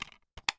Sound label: dvd case insert